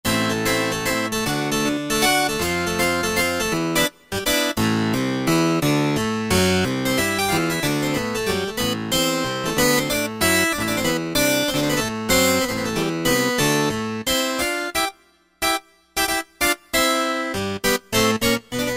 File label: Harpsicord; Factory Combi demos